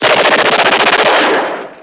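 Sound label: machine gun; PC Gamer IT CD 32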